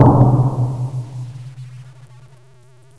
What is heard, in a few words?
shoot, artillery; nalquas